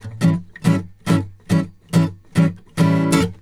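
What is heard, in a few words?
Country Guitar, Verse 2 Section 1; Digidesign; Pro Tools Essential Loops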